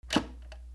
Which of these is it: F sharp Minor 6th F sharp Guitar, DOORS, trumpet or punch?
DOORS